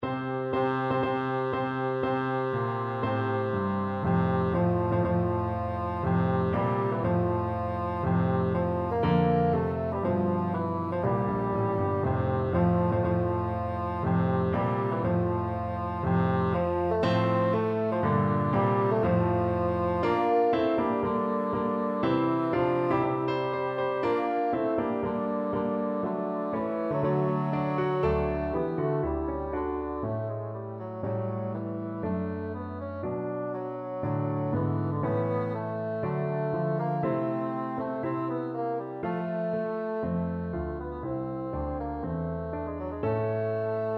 bassoon

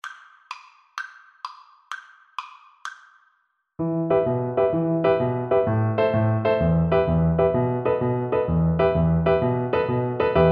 tarantella, Recorder

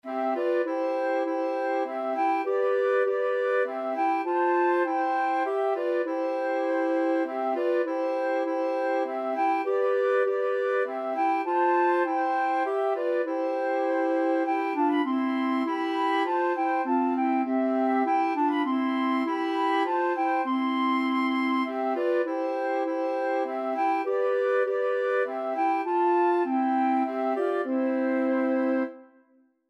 come thou fount, 2 flute clarinet

